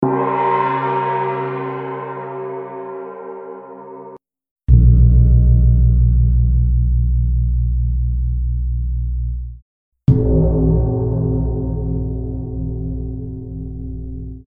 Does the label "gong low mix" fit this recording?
Yes